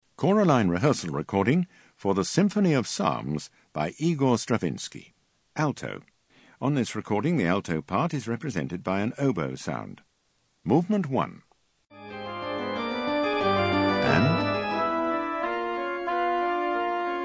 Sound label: Alto; Stravinsky Symph of Psalms